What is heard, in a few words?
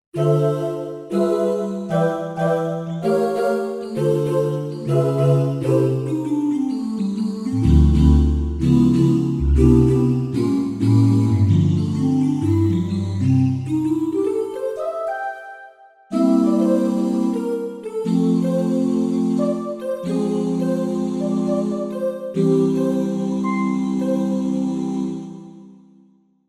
Dooh Choir